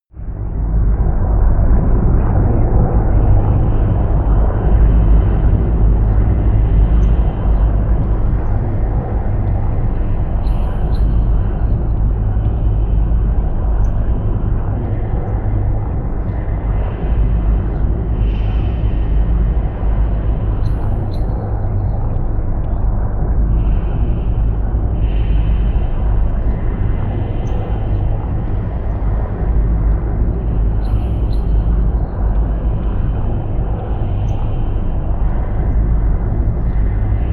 drone storm low

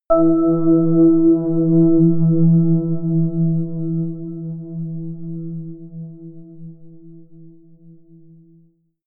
melodic odd soft bell; toene

